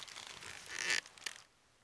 vrzani; Mafie 1

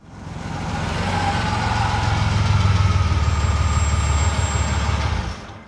auto zele; Mafie 1

